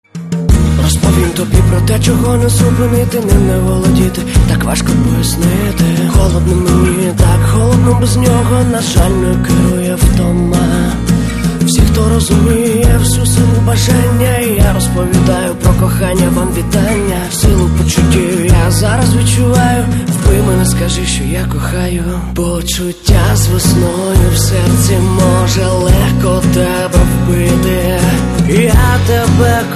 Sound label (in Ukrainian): akustychny